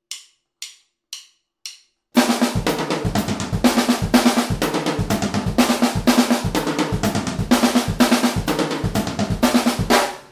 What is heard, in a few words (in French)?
break passe partout, batteur